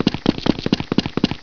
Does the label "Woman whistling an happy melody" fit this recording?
No